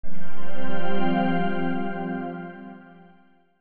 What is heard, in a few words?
pad confirm